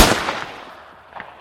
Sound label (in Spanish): tiro